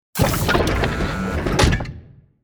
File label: machine revolve